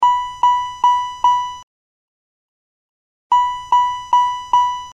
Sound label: Dong Dong